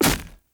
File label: Polearm Medium Impact Wood Hollow Large; Weapon Impacts; Player; Environment; Sphere Polearm